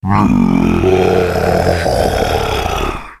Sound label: boss roar